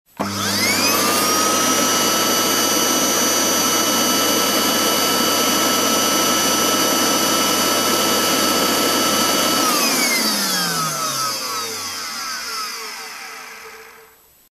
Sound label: Vacuum Cleaner